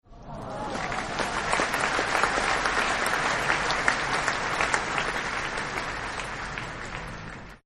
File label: Ahh Clap, Applause; Sound Effects:Human